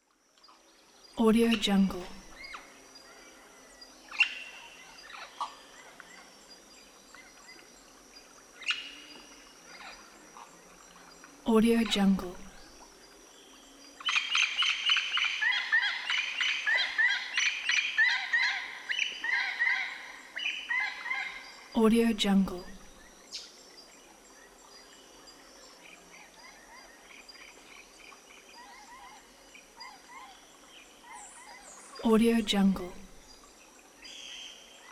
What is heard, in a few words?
evening summer forest